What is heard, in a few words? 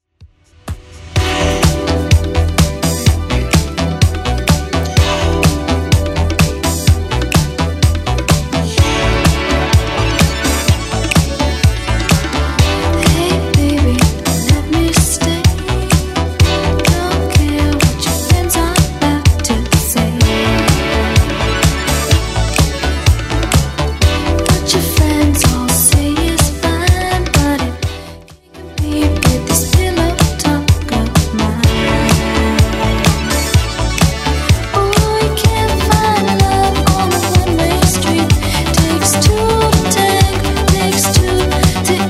70s Redrum 126bpm